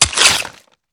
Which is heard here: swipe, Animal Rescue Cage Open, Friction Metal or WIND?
swipe